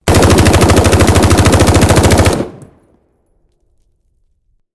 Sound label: m16 burst shot long interior